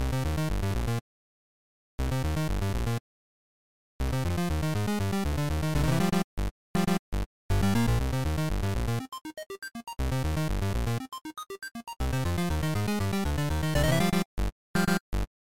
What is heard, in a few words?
BGM 2nd Loop; 774 deaths